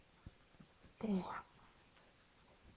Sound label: boar